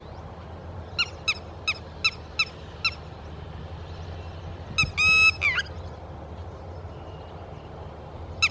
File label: Red wattled lapwing